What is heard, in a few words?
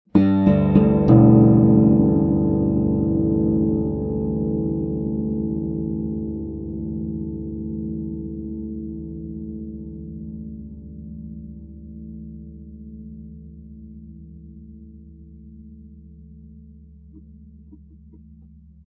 arp mic; unplugged